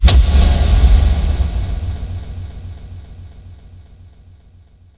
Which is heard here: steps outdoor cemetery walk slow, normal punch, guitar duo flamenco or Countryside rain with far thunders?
normal punch